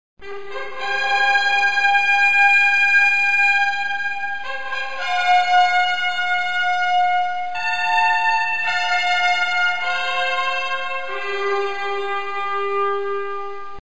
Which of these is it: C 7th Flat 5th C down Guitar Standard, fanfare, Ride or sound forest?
fanfare